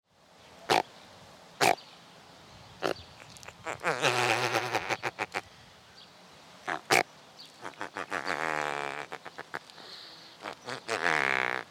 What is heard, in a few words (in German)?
Grosstrappe Rufe